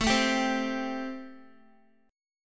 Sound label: A Minor 7th A, Guitar; x,12,9,12,12,x; strummed; Am7